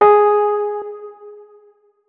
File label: notes, keyboard, electric piano